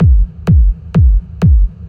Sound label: dark kick with reverb; drum loops